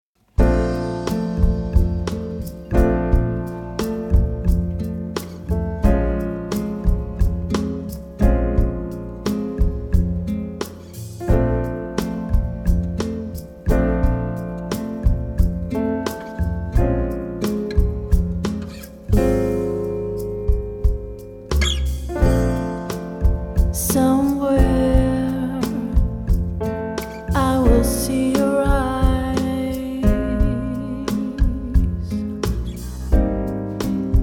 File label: jazz